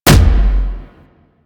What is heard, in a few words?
Explosion Punch Generic Large; Warframe; Weapons